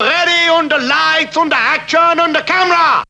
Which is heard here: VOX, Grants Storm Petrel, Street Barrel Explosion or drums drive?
VOX